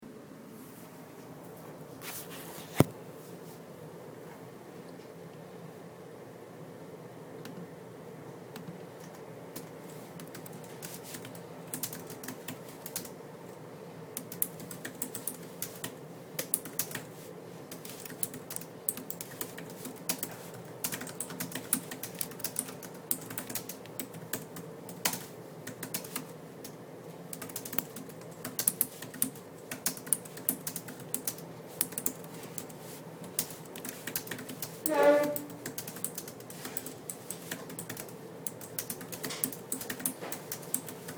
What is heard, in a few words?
Silent library; 3/15//12 – 9:36 PM – 8th floor Axinn Library You can hear the air vent, my typing, a chair moving, and papers